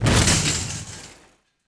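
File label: metal shatter large; objects; Star Wars Obi-Wan; XBOX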